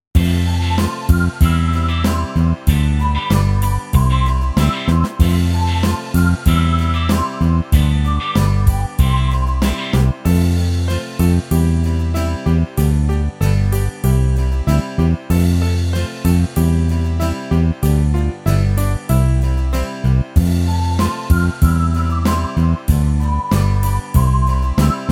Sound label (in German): Karaoke